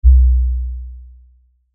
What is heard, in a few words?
LFE Hit, Lfe Hit Low Bass Impact; Blastwave